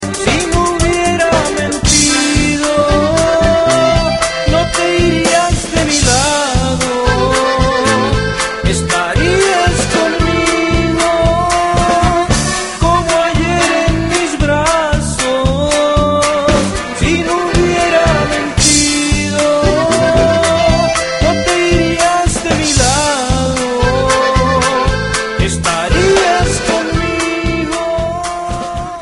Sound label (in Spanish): norteno